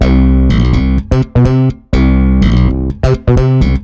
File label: Funky